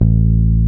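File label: A1 FINGER BASS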